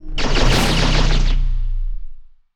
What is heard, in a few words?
fly by shoot